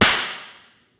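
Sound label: physics clipboard impact large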